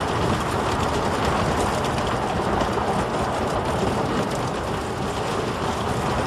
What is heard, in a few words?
normal, Roller Coaster; Dreamteck; Splines